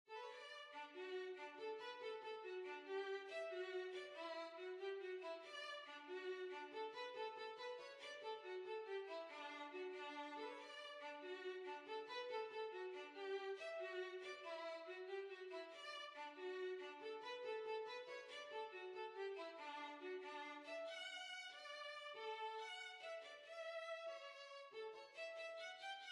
Quadrill, Violin

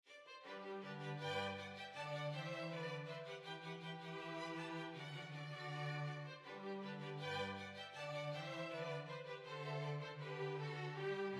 Hornpipe

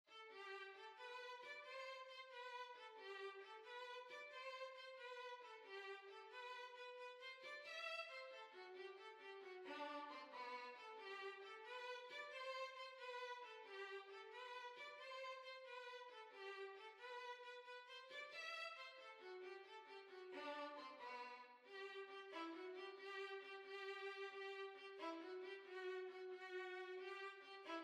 Violin

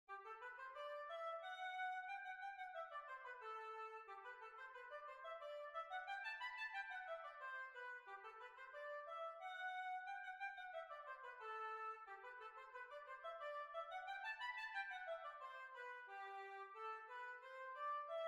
Hornpipe; Tumblers Hornpipe

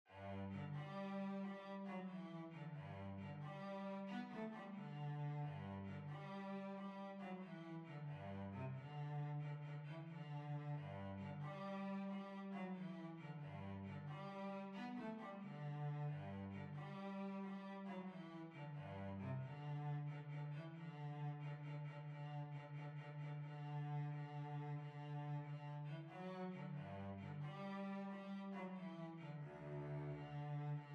Violoncello

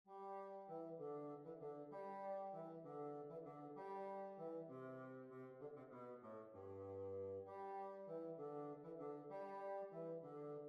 Waltz

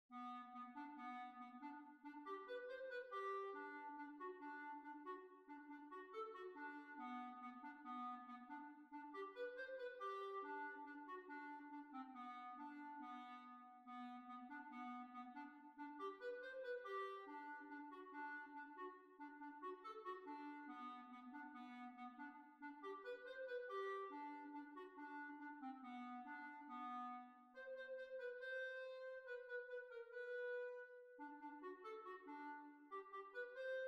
Hillebolla Schottis B flat

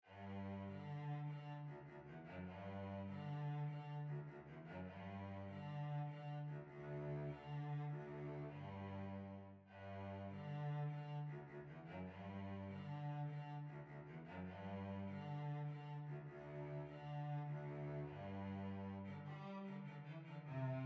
Violoncello

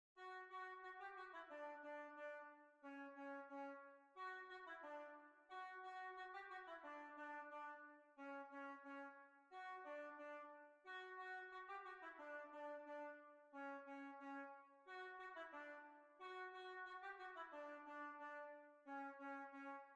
Lancashire Hornpipe

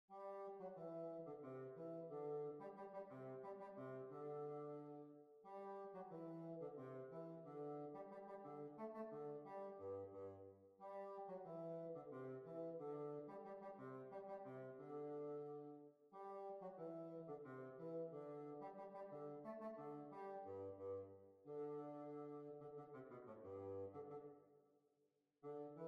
Hornpipe; Miss Menagers Hornpipe

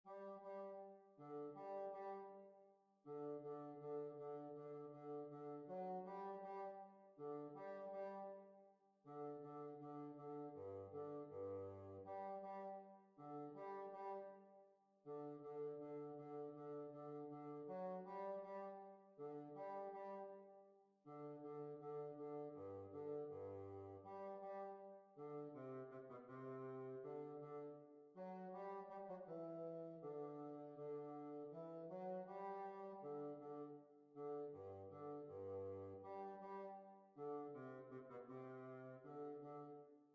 Holywells Hornpipe

